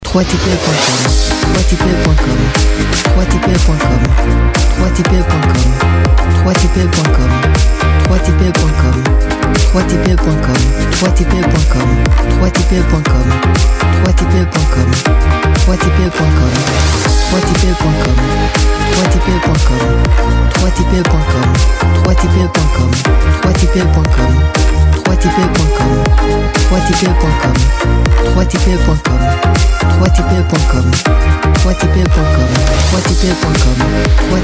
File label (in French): musique top 40; habillage de votre top 40